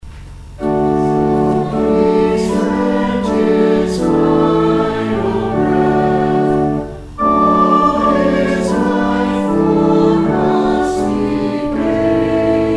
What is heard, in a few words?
hymn; goodfriday; isaiah